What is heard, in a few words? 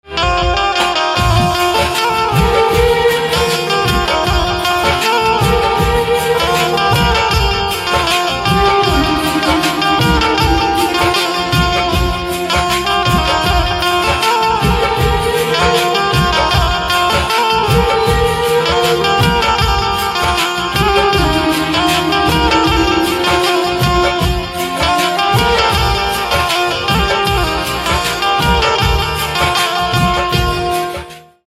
Banjo Instrumental Ringtone